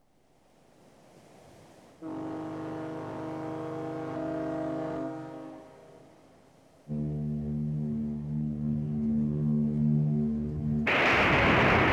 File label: Colliding Ships; BBC Sound Effects Library